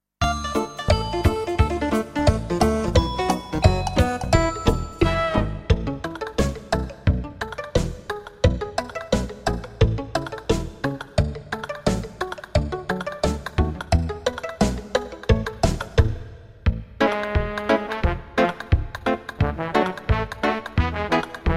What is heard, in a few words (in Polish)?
wersja instrumentalna